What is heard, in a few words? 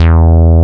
BASS, Tekno Industrial; East West